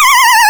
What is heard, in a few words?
Hard PCM Chip; chiptune